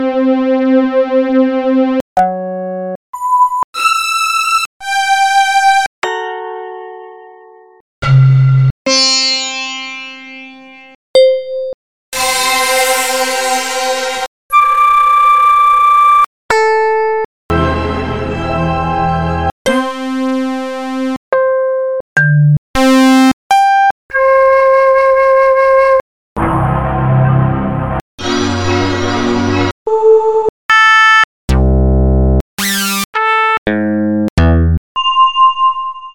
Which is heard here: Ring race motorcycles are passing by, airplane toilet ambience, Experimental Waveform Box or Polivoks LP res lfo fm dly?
Experimental Waveform Box